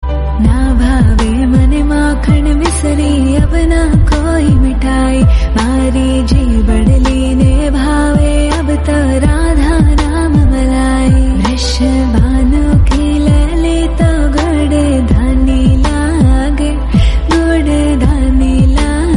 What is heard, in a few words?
KRISHNA BHAKTI RINGTONE